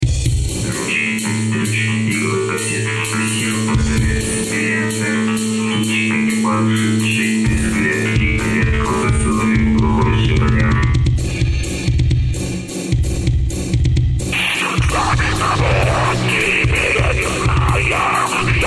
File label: industrial